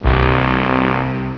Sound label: alarm